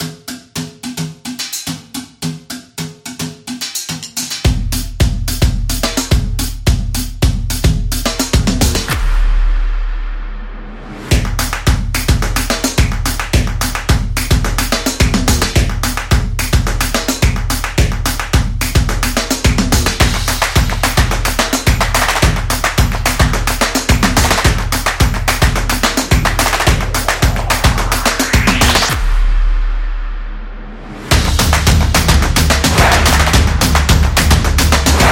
Kitchen Music; high energy

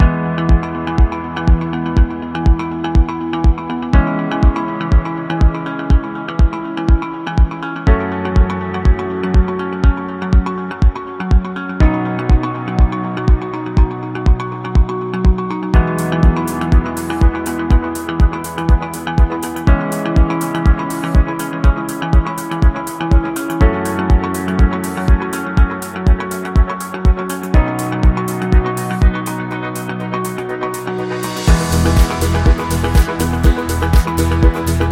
Motivational Corporate Dynamic Music Luxury

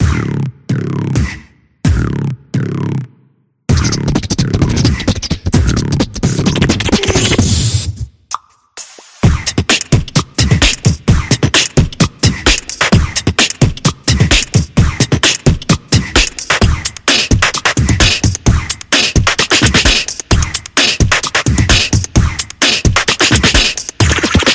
Beatboxer

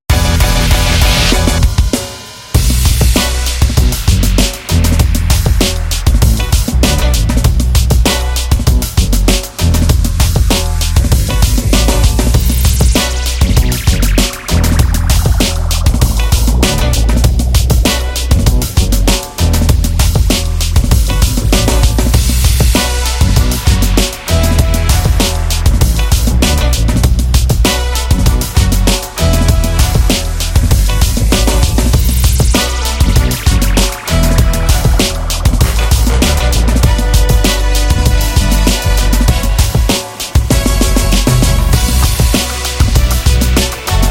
Street Vlog

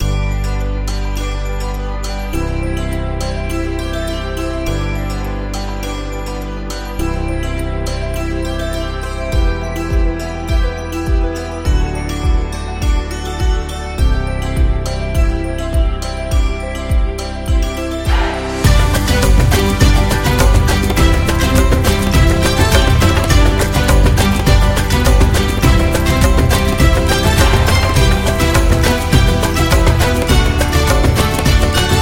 Indian Corporate Music

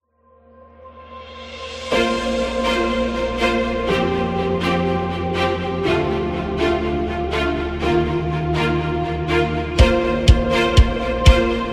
Epic Award

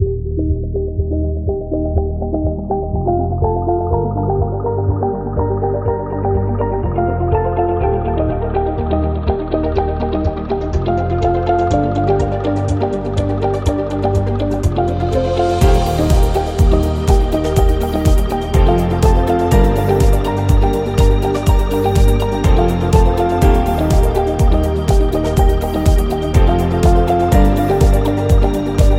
Upbeat and Inspiring; corporate background

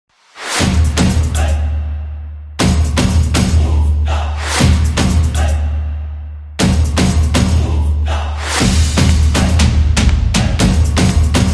Basketball Promo

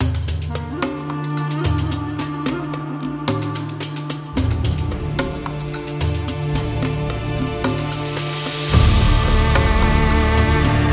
Islamic Documentary

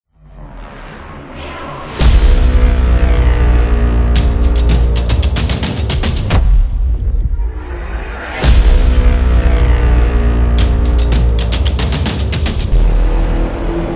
This Is Sport Opener, soccer drums

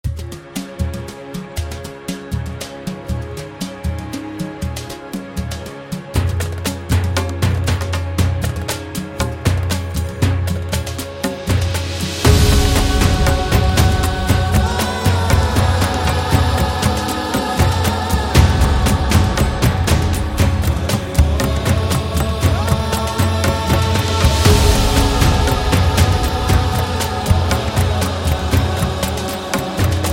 Oriental Documentary

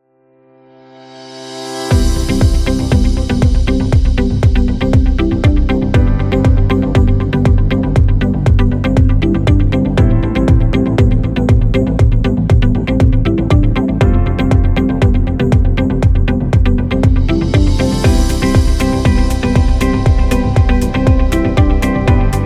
Office Background

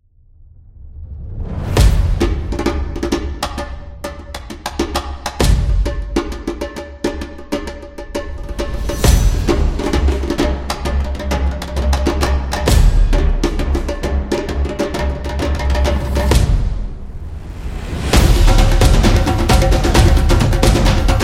Africa Tribal

